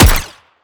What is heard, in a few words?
Sciweap Shot Small Gun Slider Dart; Robobeat